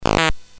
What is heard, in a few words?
BASIC sid CSG8580R5, GAMES; Terrible Twins